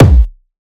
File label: kick focus; Drum Sample Pack; One Shots; Slate; SEISMIC